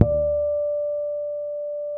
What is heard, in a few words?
bass, D 5; Spectrasonics; Bass Legends CD 1